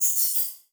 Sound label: Multimedia Internet CD-Rom Flash Animation